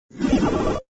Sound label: Static Blip, Rollover or button sound